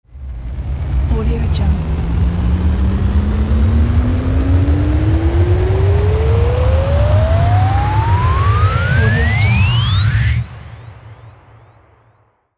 background noise distortion transformation

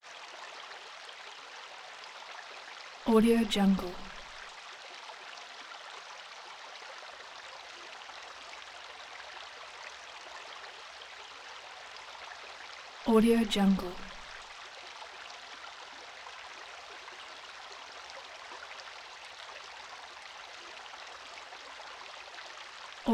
river ambience water flowing metallic; prosoundfx

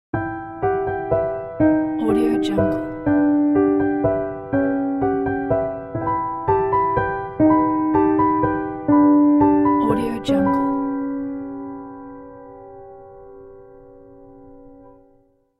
christmas piano logo

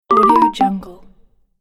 videogame bloop fail

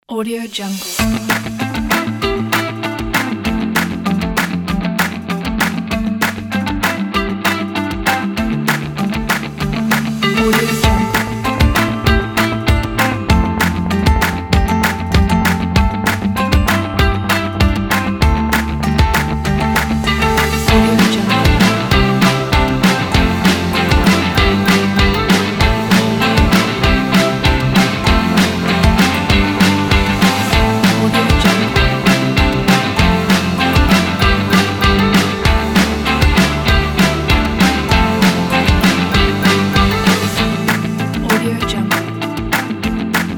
energetic upbeat happy indie rock

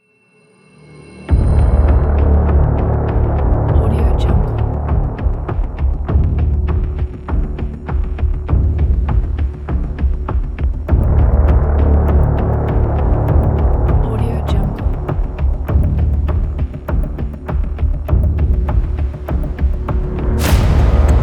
for suspense trailer; soundride

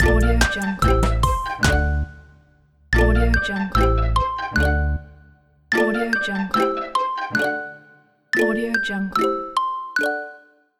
bright logo

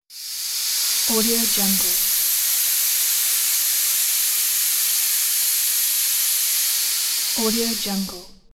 air pressure

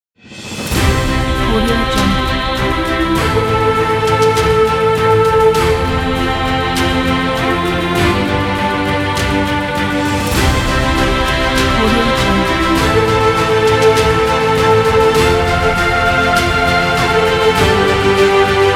positive orchestra logo ident

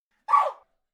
chimp barks

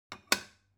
object kitchen stone bowl shelve short

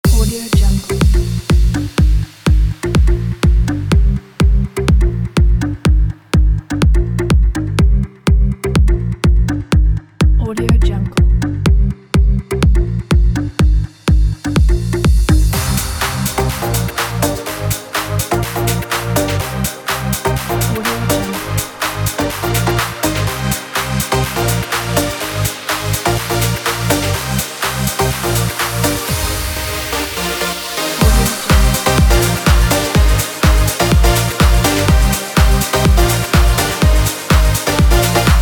club house

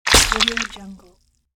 mud splat

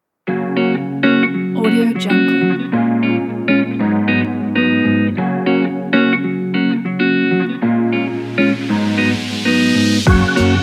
carefree funky hop

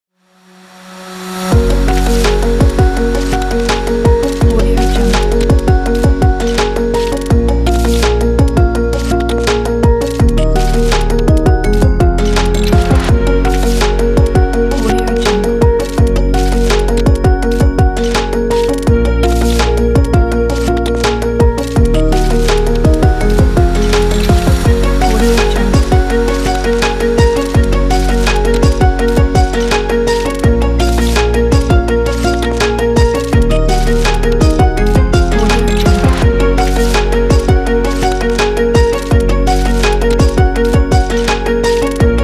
technological orchestra of the future

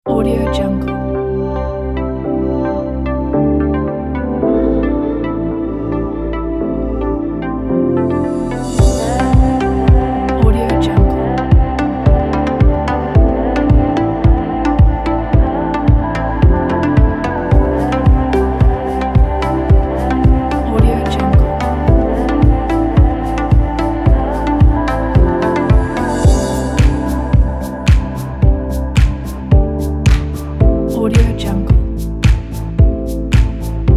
inspiration vibe

